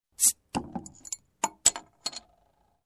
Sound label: Bottle Cap open